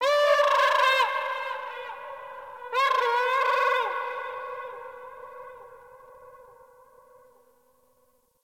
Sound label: Distant Creature; Warframe; Eidolon Plains; Ambience